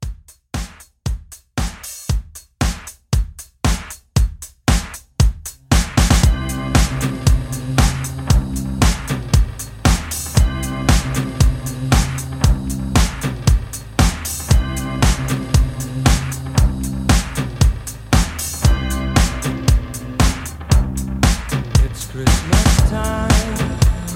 80s Pop Holiday Re Drum 116

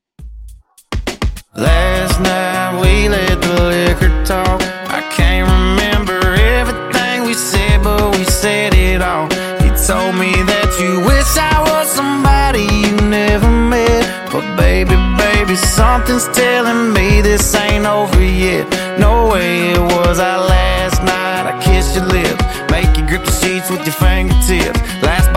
Funk Redrum 102 Bpm

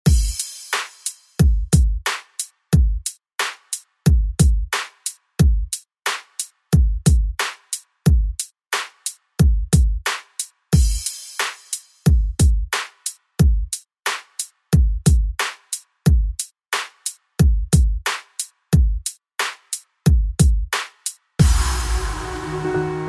Dance Redrum; Deejay Hit

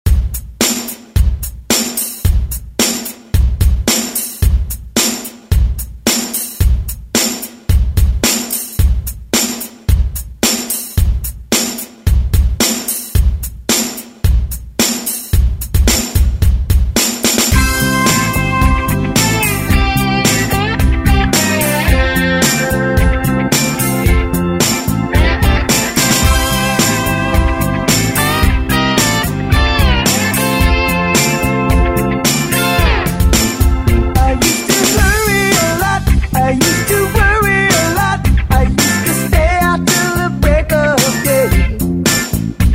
Rock Redrum snippets

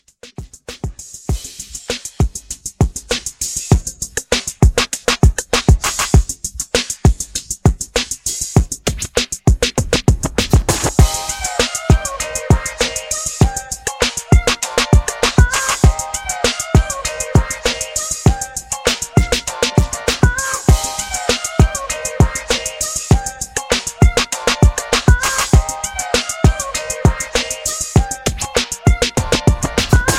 Re Drum 99

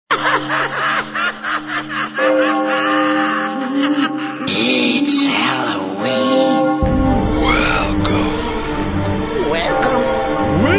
Tecno Halloween

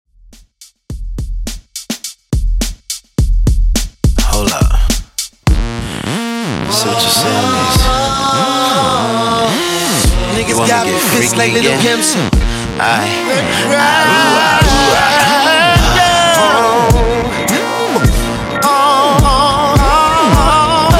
Old School Redrum 105 Bpm

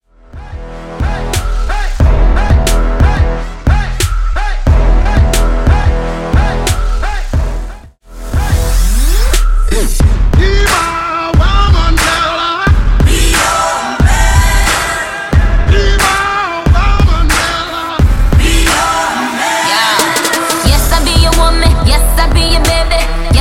Beatshow Remix 90 BPM

